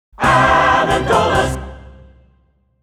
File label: Jingle Sing With Music; Amendolas